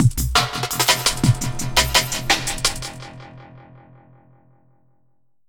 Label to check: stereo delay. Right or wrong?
Right